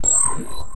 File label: alien game ui glitchy sci fi bleep digital short laser